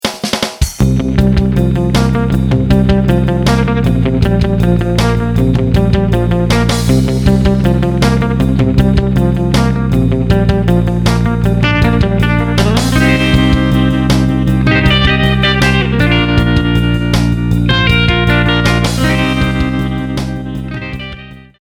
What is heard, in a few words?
patch demo